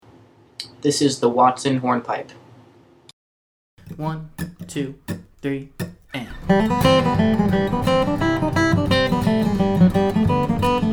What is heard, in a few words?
Hornpipe